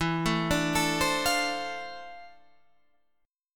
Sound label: D-Minor 7th, E; Dm7/E arpeggiated